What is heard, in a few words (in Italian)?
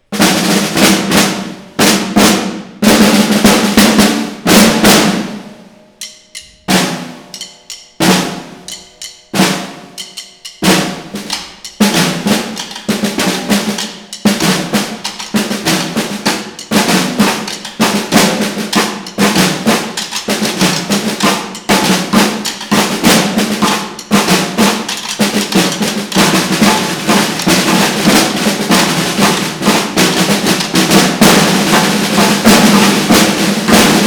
percussioni